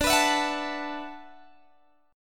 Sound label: D 6th Add 9th, Guitar, Open G